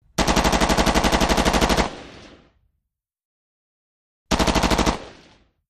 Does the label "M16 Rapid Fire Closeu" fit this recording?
Yes